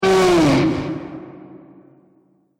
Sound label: Scream Huge Monster, Monster Sound Effects; horror